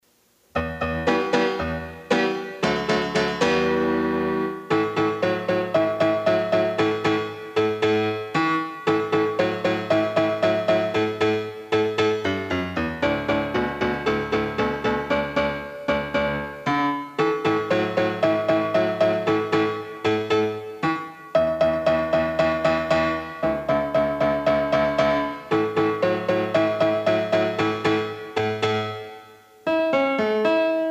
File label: rockin fiddles piano